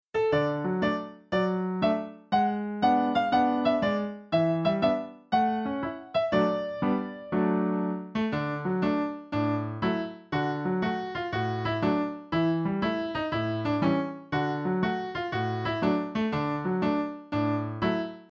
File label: the old gray mare instr; folk